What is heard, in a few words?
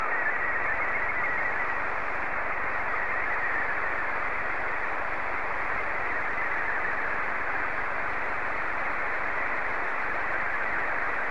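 SSTV, Scottie 2; VK4MSL SSTV RX @ QG62ln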